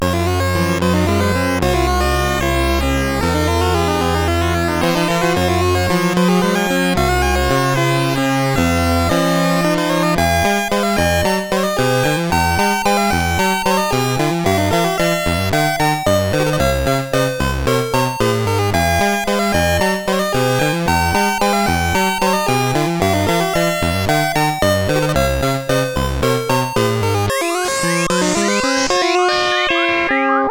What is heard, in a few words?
sid CSG 8580 R5